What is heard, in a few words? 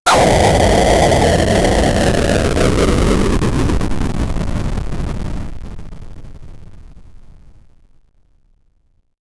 retro game sfx fire eleme